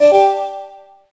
Email Alert; msntv2

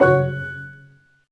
Email Delete; msntv2

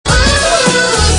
oohoo

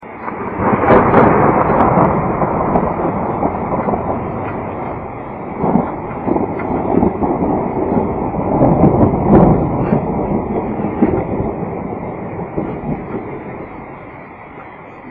thunder

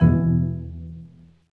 Page Boundary; msntv2

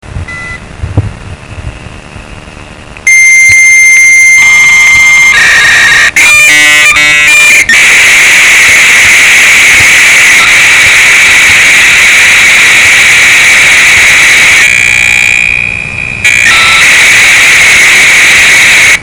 usr v90, Modem Sounds

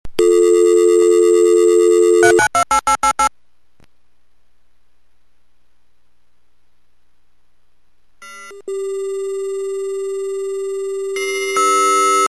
v23, Modem Sounds; sm56

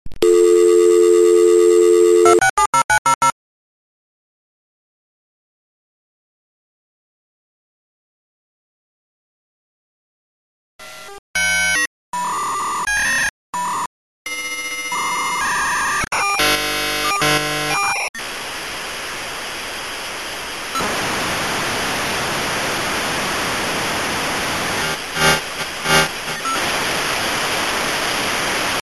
sm56 v90, Modem Sounds

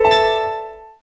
Email Sent; msntv2

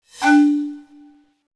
Panel Down

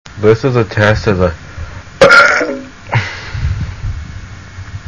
test burp

